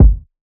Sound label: kick low; Drum Sample Pack; One Shots; Slate; SEISMIC